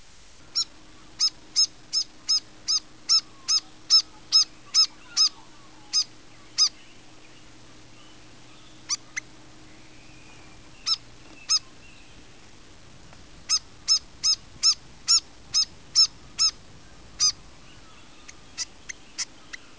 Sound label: Fantail